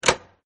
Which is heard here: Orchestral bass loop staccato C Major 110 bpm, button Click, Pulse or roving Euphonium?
button Click